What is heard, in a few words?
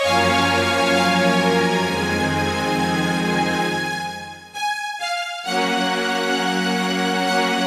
ORCHSTRINGS; multitracks